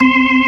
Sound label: ORG, Organs